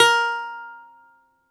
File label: BALALAIKA, Russian Strings